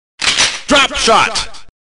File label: Drop shot